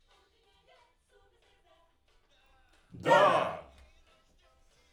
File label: Backs AKG